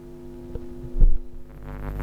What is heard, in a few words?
apple shop, electromagnetic; electromagnetic Aix; KUBISCH